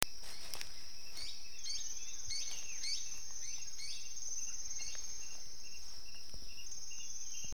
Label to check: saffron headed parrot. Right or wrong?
Right